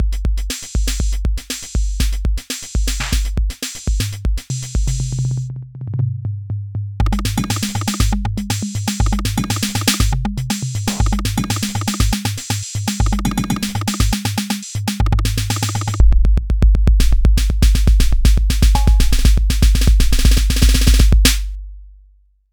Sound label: perc test